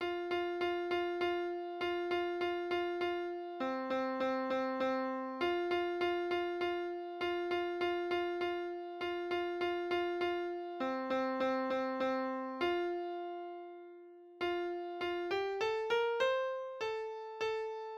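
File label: big society piano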